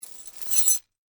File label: Industry Chain Pick Up Tow Truck Cable; Factories (Sound Effects:Backgrounds)